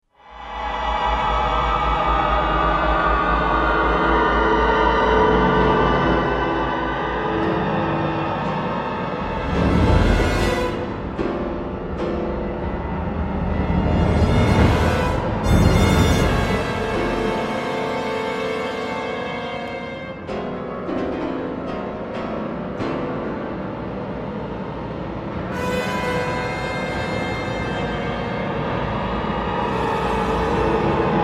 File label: Piano, Orchestre